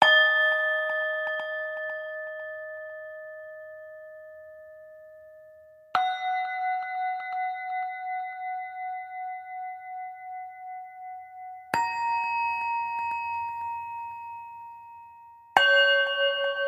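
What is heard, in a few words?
Music Village Bell D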